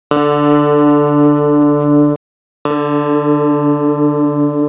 chorus

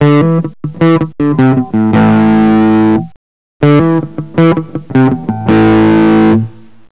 reverb

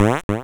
trap; spelunky 1 1

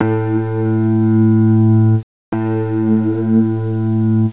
flanger

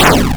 slam; spelunky 1 1

